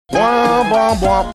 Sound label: doing mouth saxamaphoning; night court